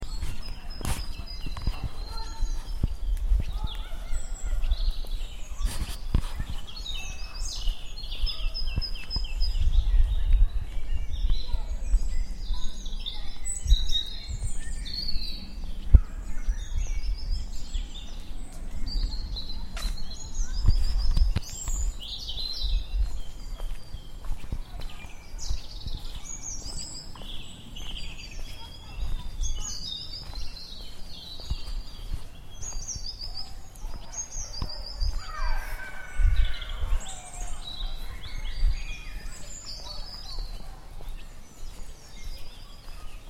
birds singing in wood; queen's wood; sound of the day

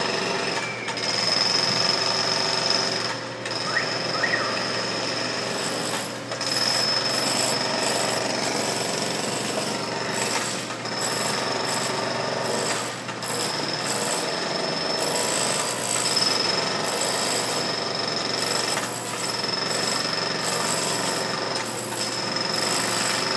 dawn chorus

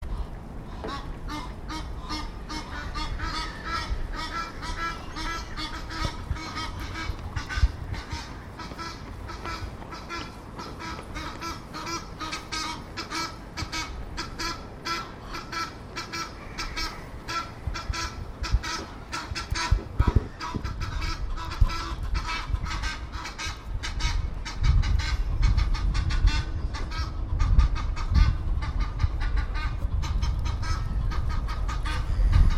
excitable thames birds

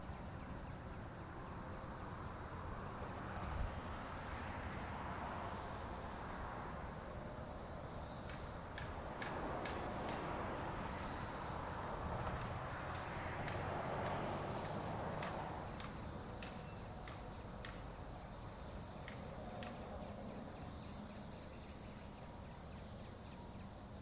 cicadas dusk

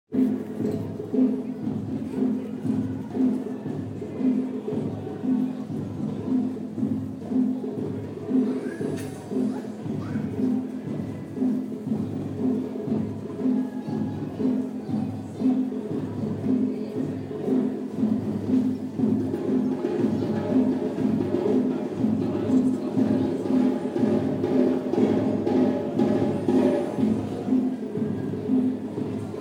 summer drums; nantwich

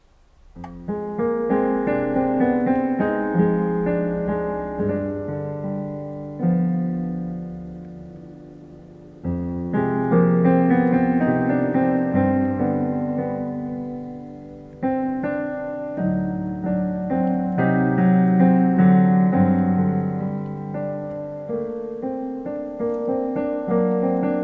life ends piano

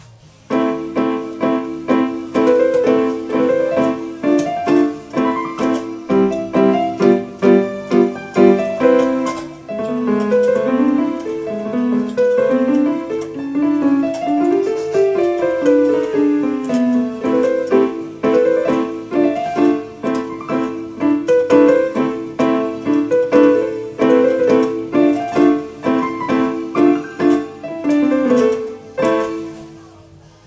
arabesque on casio ctk 810

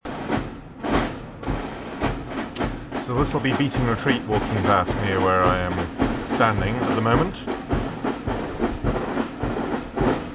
beating retreat marching away at the end

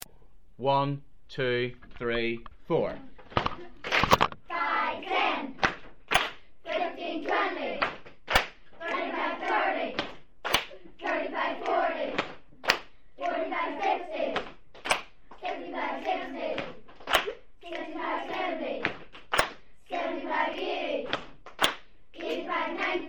5x tables chant

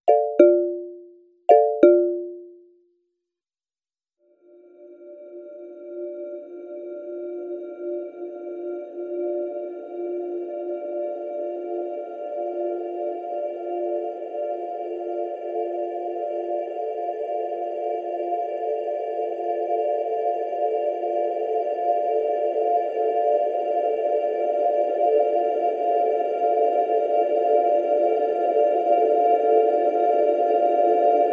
paulstretched marimba

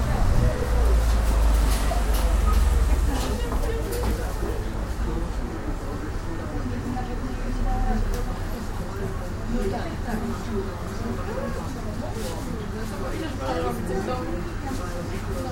south norwood high street shop interior traffic

